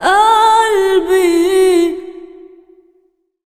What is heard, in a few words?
SINGER, Arabian Traditions; USB Soundscan vol.43; AKAI